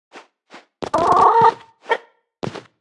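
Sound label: Super Chicken, 动作音效; 母鸡; 绿野世界; 卡通农场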